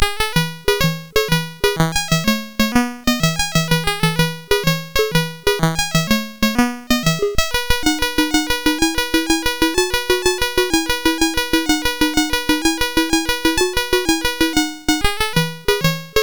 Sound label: sid CSG8580R5, GAMES; Firequest